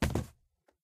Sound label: phys cube, physics